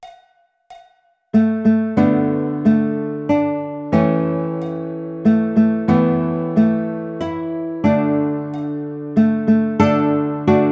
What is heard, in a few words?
Baritone, ukulele; Happy Birthday